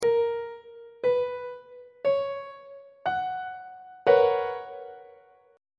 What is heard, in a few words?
c flat major 7th suspended 2nd 3rd inversion on treble clef